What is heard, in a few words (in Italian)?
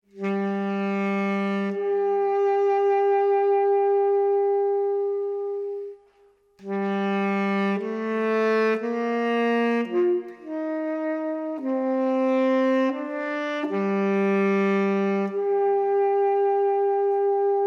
Ballata Per Sax Tenore E Banda